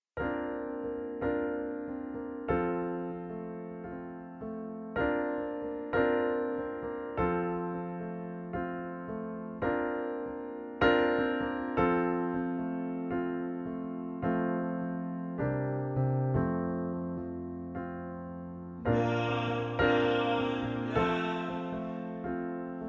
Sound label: SUB ALTO